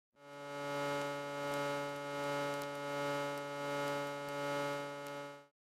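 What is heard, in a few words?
Electric Hum Buzz High; buzz & hums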